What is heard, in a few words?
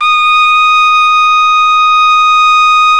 TRUMPET; Best Service Gigapack 1